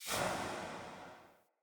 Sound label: Word Upgrade Clear; Word Upgrades